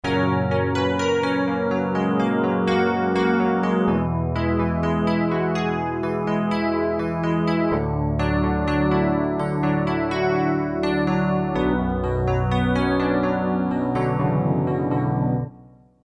tine, Korg N1R; tines ep

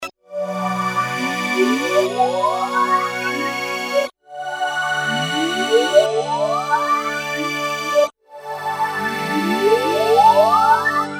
pad reverse, emu carnaval; Motorola 68000 CPU (mc68hc00fn10) system handles up to 32 voices polyphony

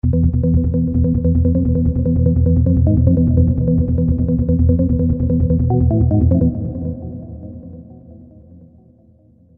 organ techno, roland jv2080

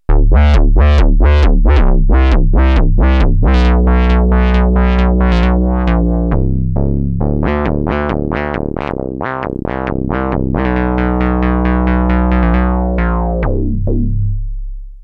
synth, Moog Minitaur; another last LFO; analog monophonic synthesizer

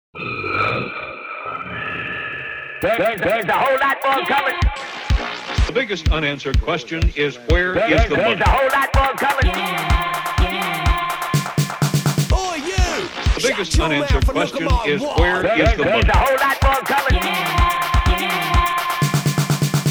full intro theme by Roland Sh101; Blood Money- Psygnosis (1989)

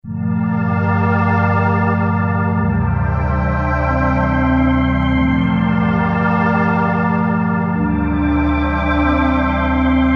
korg m1r synth

